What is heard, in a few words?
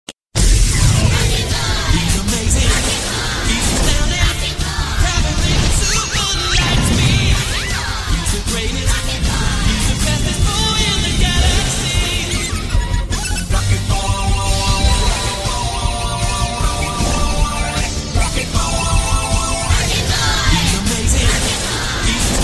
theme song; rocket boy and toro